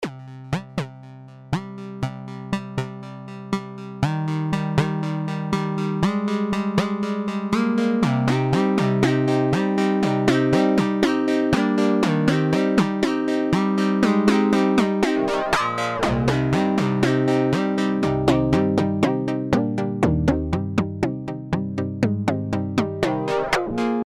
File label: Arp; Strobe; KB Eco